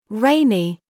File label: rainy